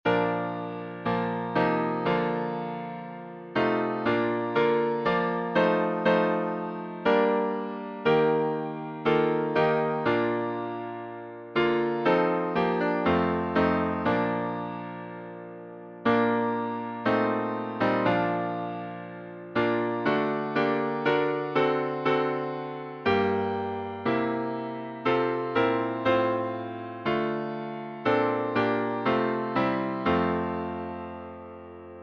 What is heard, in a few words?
Hymns